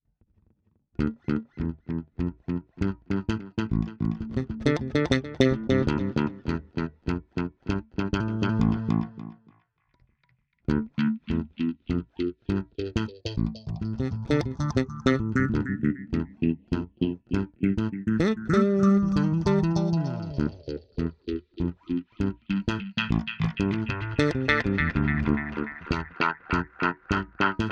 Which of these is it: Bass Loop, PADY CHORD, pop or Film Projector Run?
Bass Loop